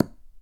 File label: Golfball Land Carpet; Misc Sports